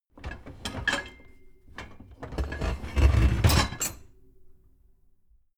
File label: dishwasher tray